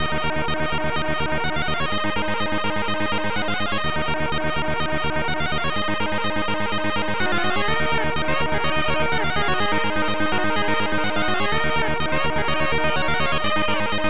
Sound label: syntune; Multimedia Toolkit